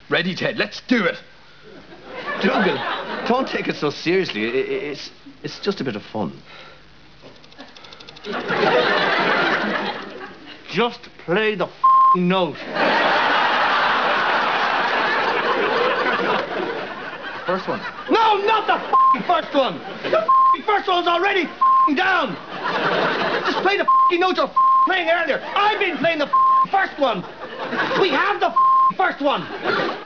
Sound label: beep note; Father Ted